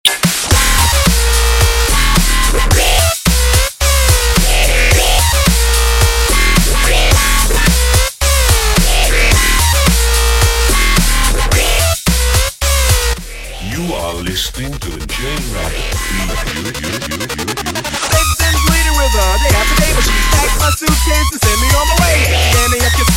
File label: dubstep fresh